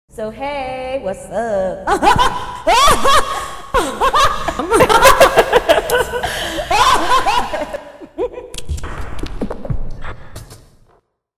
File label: Laugh Ringtone